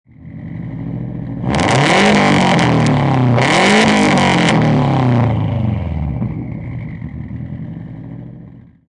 Subaru Sti Exhaust Double Rev, High Performance Car